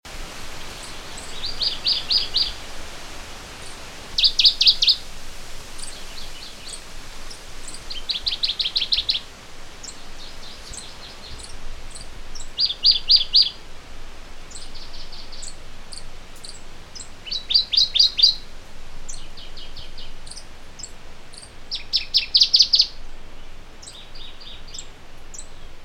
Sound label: Dusky Warbler Phylloscopus fuscatus fuscatus